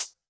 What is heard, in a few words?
disco hihat; Electro